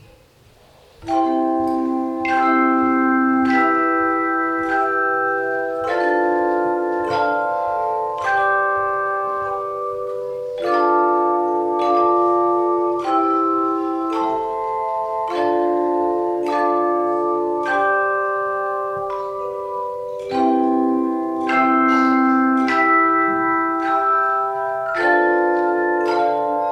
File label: Chimes in C Major; Hand Chimes; chimechoir